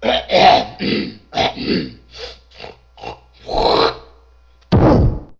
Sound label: clearing the throat and spitting a loogie